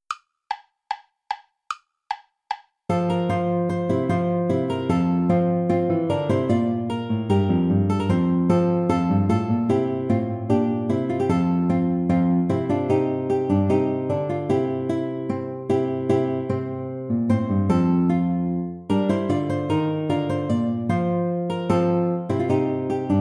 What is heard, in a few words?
bourree iii play along without guitar; praetorius michael